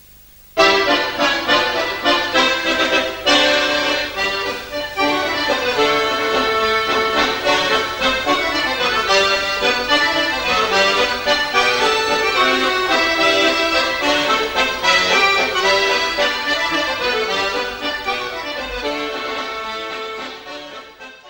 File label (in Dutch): Winterwals - Belgique